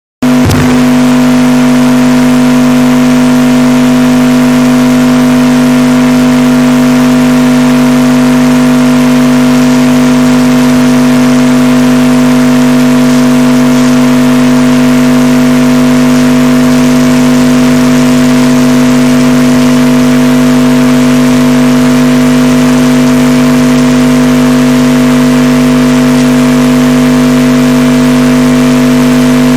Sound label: Morning Noise Broadcast